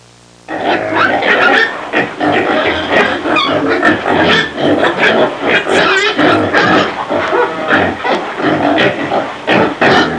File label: Farm pig noises